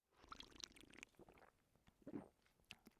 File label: drink and swallow